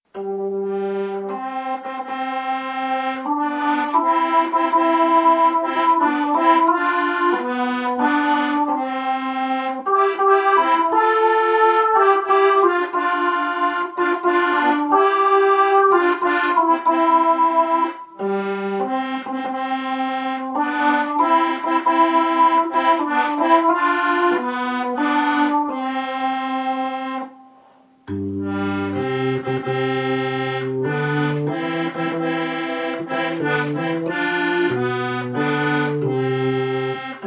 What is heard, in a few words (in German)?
O Tannenbaum Keytar